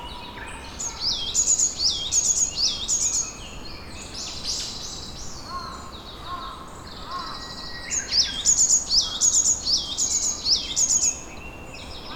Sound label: yamagara